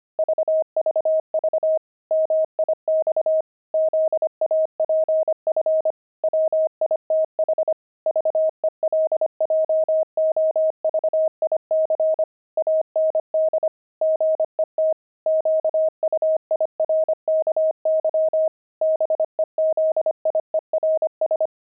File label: pangram, intermediate pangrams files